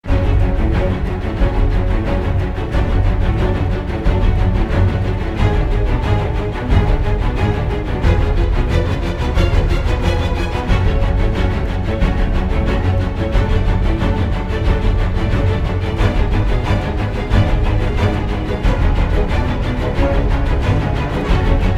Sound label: Orchestral Remix; X Pack